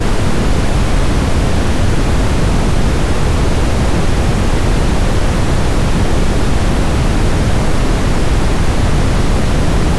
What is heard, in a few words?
brown noise